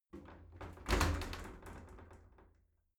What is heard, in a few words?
shower slide door rattle hit